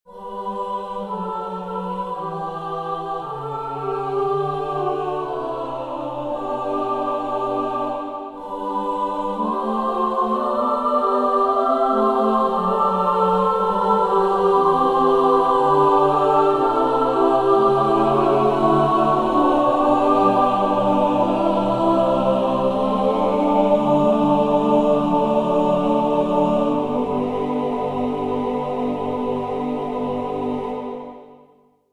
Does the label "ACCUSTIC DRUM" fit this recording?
No